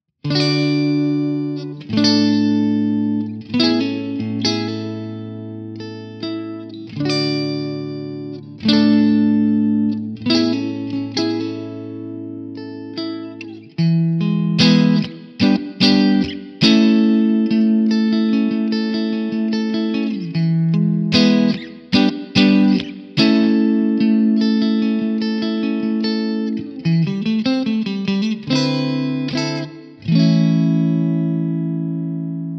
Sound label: clean; jensentone